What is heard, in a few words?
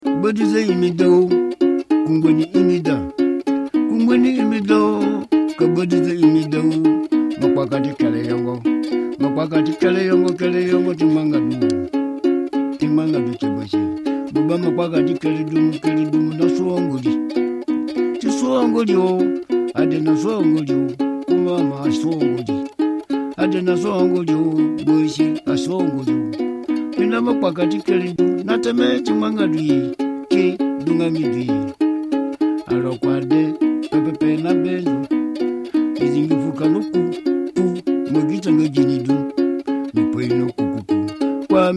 Nzakara Harp Songs; Brenner; Vienna 2007